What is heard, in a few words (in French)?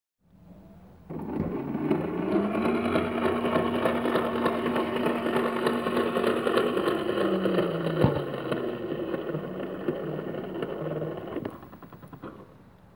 portail